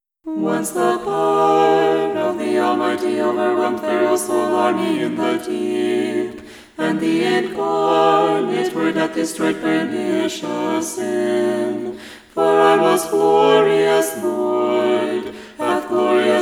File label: Irmos; Choir